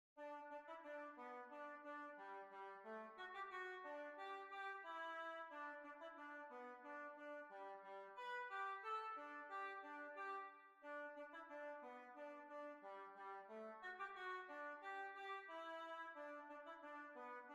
Hornpipe; Portobellow Hornpipe

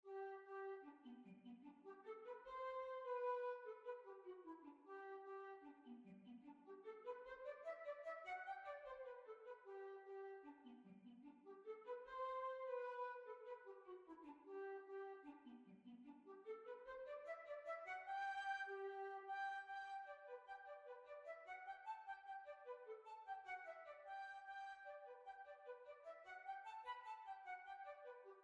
Jig; Mr Richardsons Jig

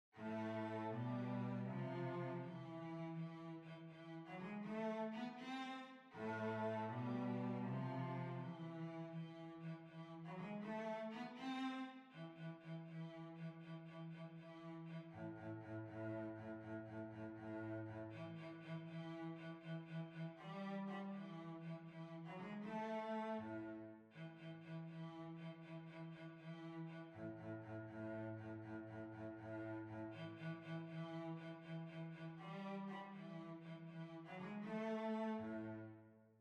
Violoncello

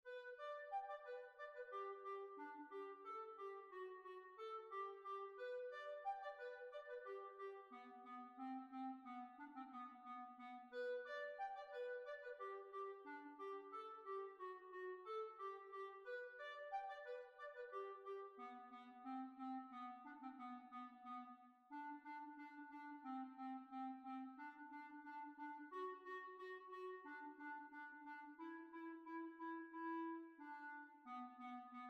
Hornpipe; Steam Packet Hornpipe; B flat

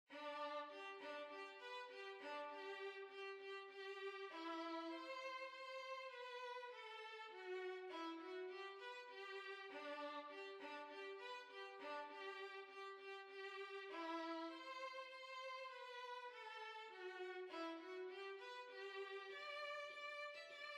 Polketta, Violin

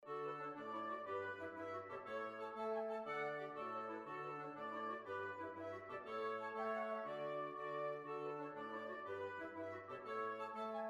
Jig

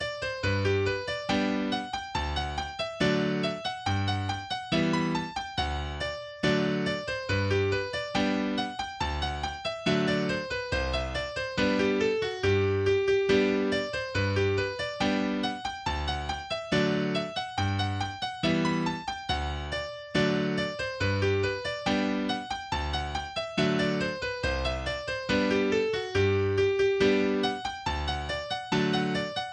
Billy Twist Hornpipe, english dance tunes; eighty english dance tunes

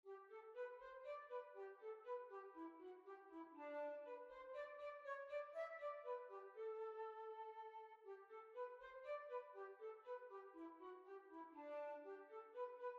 Kerry Mills Barn Dance

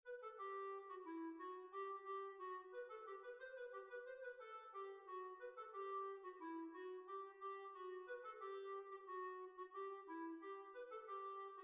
Hornpipe; Miss Menagers Hornpipe; B flat

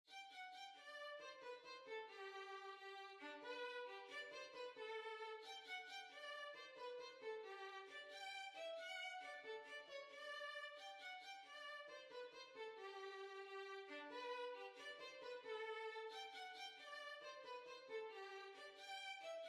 Violin

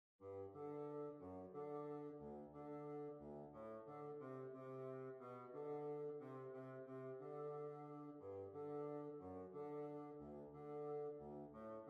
String Quartet

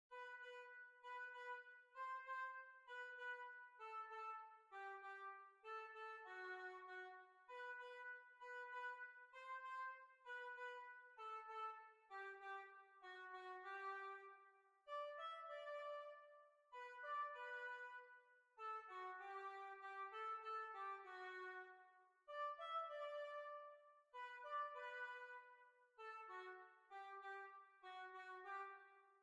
Waltz; Harry Enfields Waltz

